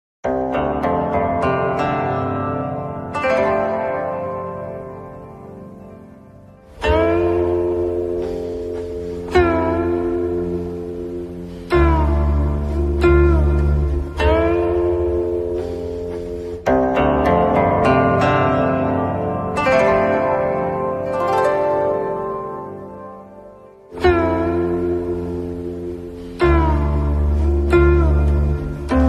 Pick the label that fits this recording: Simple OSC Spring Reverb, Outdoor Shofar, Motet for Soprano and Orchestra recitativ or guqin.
guqin